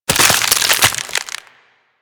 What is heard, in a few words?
Ice Freeze Hit Effect; Warframe